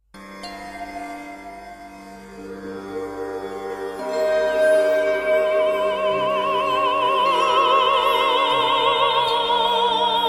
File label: Female Choir